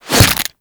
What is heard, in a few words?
Barb Hit Default; Warframe; Desert Skate; Hit Effects